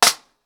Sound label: Snare Clack, drums singles; The Roots Drumloops